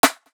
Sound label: Rim; One Shots; Prince; Cymatics; DOPE DRUMS VOL. 1